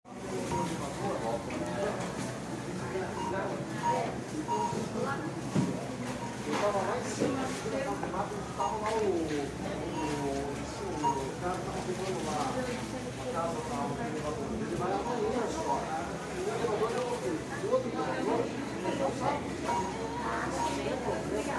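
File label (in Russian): супермаркет; zapisano v matinhospr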